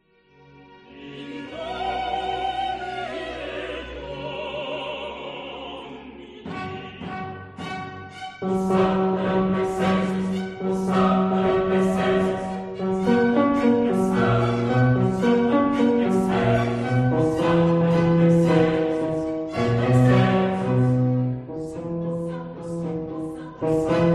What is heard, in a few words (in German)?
Benedictus Bass; spatzenmesse